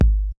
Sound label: Kick; ZERO G - Datafile DISC 1